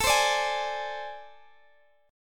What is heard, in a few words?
A Minor Major 7th Double Flat 5th, down, Guitar, Open D